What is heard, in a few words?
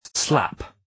slap